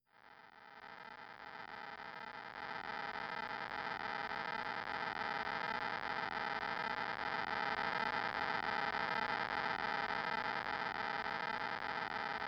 radiation noise

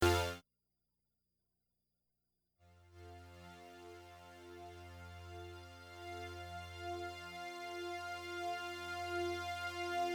alien drone

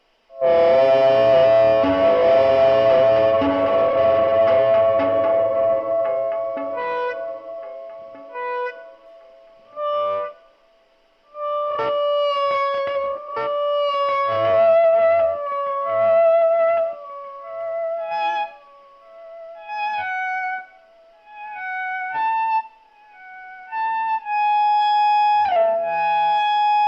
E drone gtr

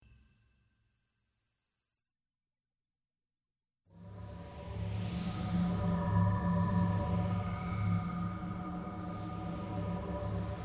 dunes noise

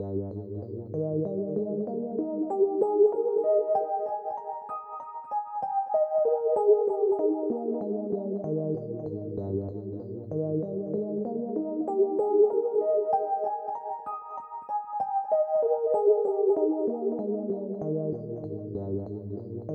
arpegio Eb 64

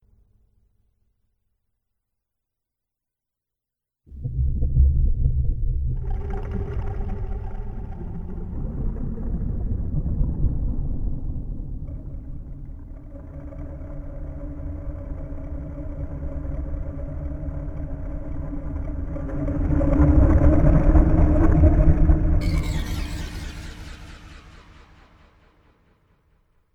under water noise

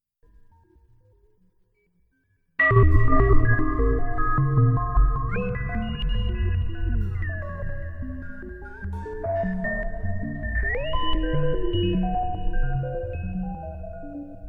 Eb drone

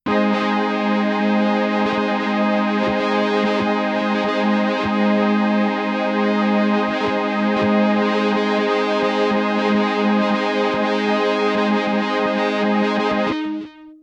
Ab fuzz drone

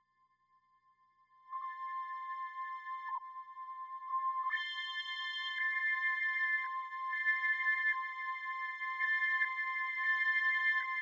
alien drone

